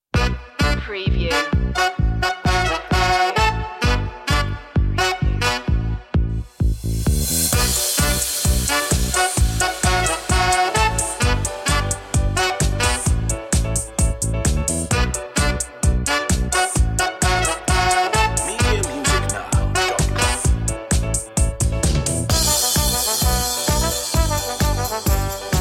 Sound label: funk jazz; media music now